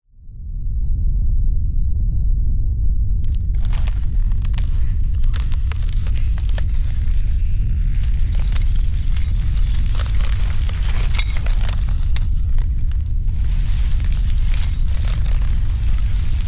earthquake rumble crack appear debris fall into movement; nature